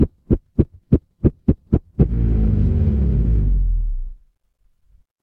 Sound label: mechanical engine